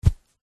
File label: footstep single walking on thick fabric stomping; zapsplat